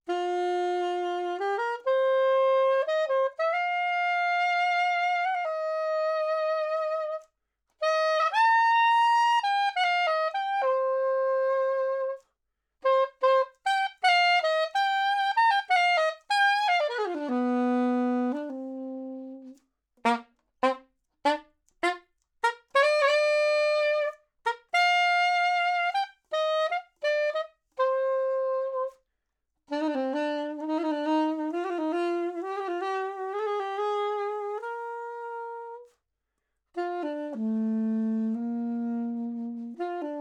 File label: Sax Soprano 50cm C Mid